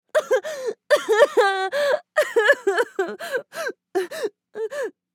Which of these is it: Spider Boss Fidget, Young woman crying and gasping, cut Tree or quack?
Young woman crying and gasping